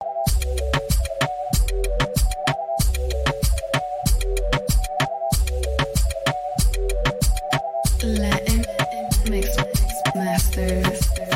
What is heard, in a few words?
Reggaeton vs Moombaton Mix